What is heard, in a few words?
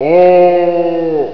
Fall; Geary Fall; Macworld (1997-11); Games World; Shareware Games; Arcade; Peng! 1.0